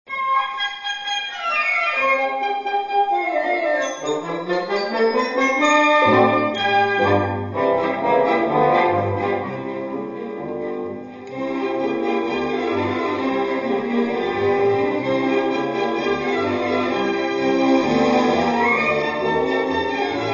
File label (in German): Portugiesischer Fischertanz